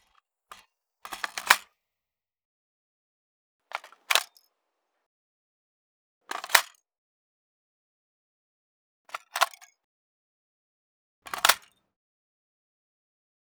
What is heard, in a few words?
Heckler & Koch G36C 5.56mm foley close up mag in & out; MKH60; Various Gun Foley & Handling